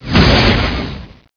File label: Ion Impact Hull; XWing